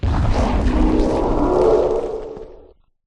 prepare attack, evocation illager; Minecraft

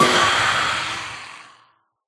death, phantom; mob; Minecraft

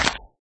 grass, dig; Minecraft

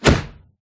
knockback, player attack; Minecraft

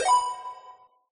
point; Minecraft; chippy

